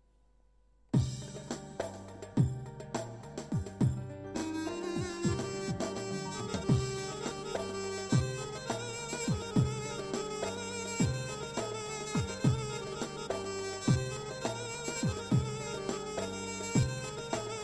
pa 55 tr mey balaban duduk; ses ve ritim demolari

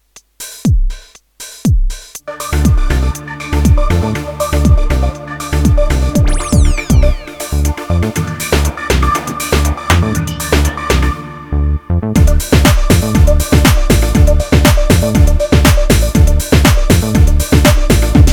pop; roland gw 8 ritim

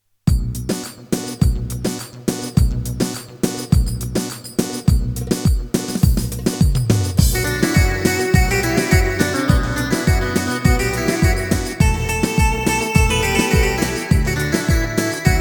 hey onbesli a300 tr v2

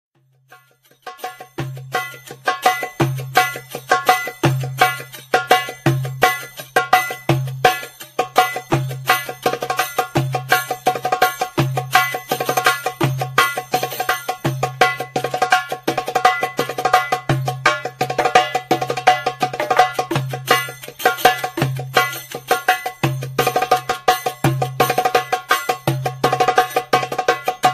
gem genesys comlek, darbuka

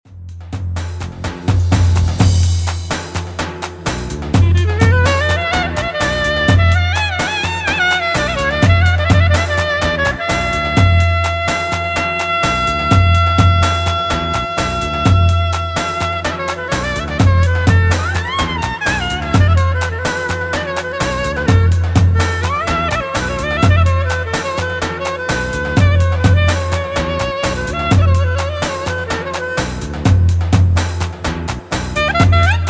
tyros3 9/8 davul

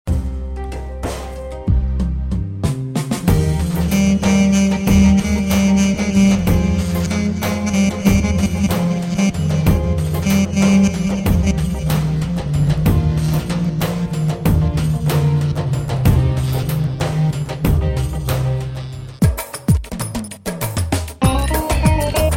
pa3x turk, turkis sesler

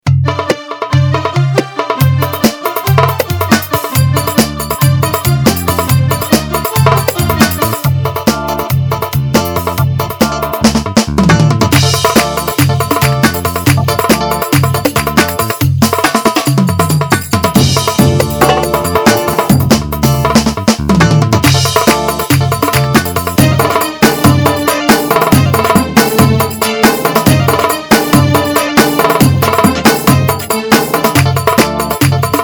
roman ritim

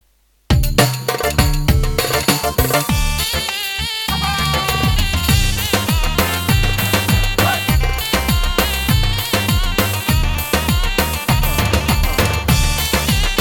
pop 2 4, roland gw 8 ritim

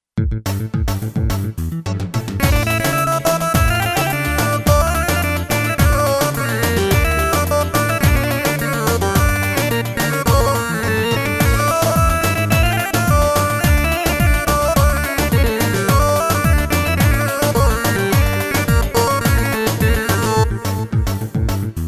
rumba, gw 8; gw-8 e ritim ve ses

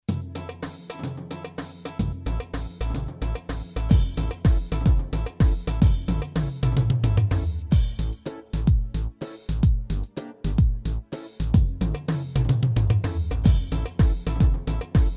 horon

